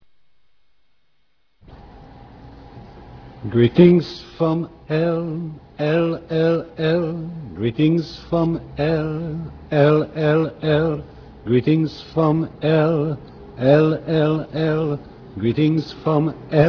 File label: chant